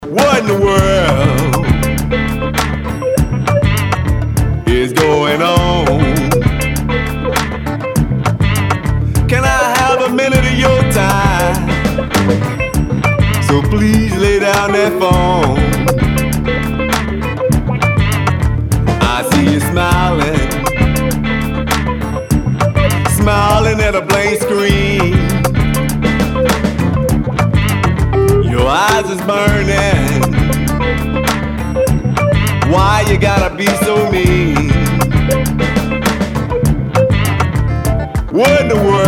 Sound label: Urban Blues